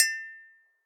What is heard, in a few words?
Ting; Foley Percussions